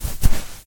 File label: footstep sand walk; Poppy Playtime; ProSoundCollection v1 3